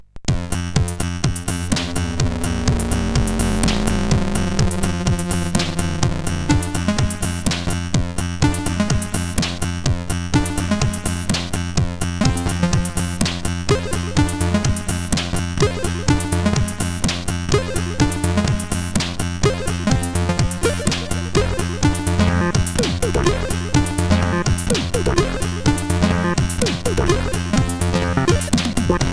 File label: Bus Noise main tune, CSG8580R5